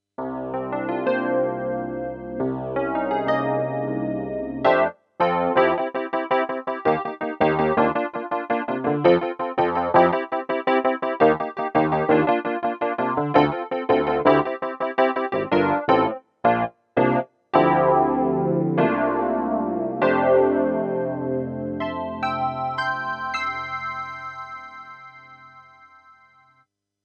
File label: stagepianos kurzweil wurlitzer